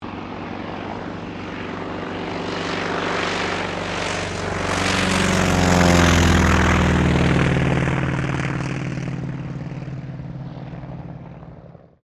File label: AIRCRAFT T-28D TROJAN TAKEOFF